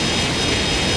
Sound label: Rocket Missile Loop; SFX Kit; Weapons